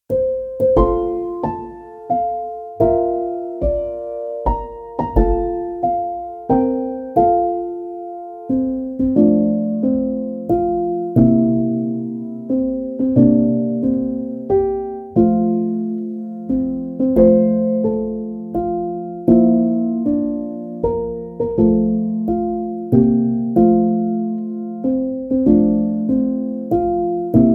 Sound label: happy birthday to you piano version